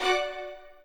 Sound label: strings